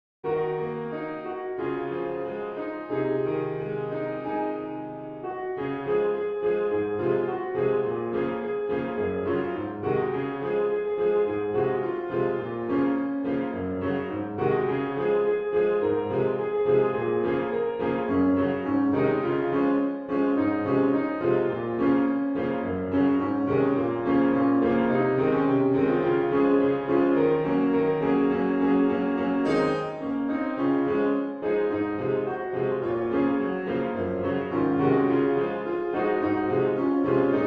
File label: Piano Cover